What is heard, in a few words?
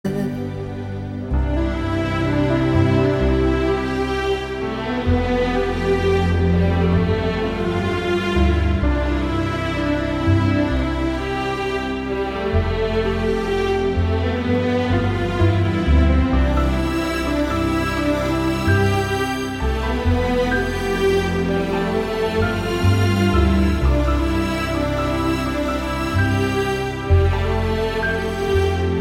Sweet Music for iPhone Ringtone